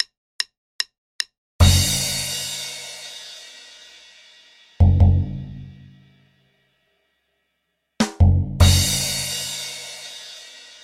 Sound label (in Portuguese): batida